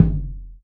strong bottom end kickdrum sound g key

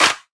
clear clap one shot d sharp key; single hits one shots

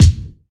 treble rich kick sample f key; kick drum samples

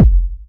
warm kickdrum sound F key; drum single hits one shots; kick drum samples

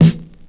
fluffy snare drum sound e key

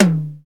hi tom one shot d sharp key; tom drum

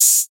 airy open high hat sound a sharp key

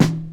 natural snare drum E key

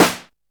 old school ambient steel snare drum D# key; Duration 0:01

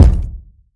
tight lows bass drum sound d key

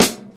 trebly snare one shot g sharp key; snare drum

